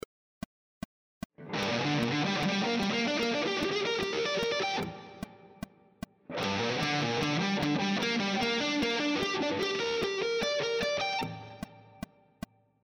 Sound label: triad arpeggio sequences, guitar